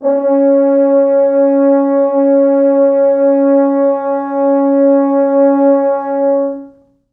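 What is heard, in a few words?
4 French horns sustain C#3; East West; Quantum Leap Horns Sax; 4 FrHorns SUS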